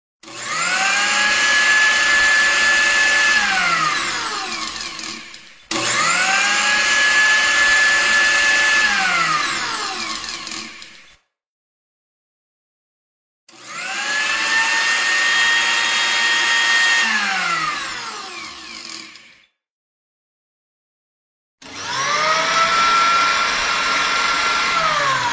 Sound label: blender; افکت صوتی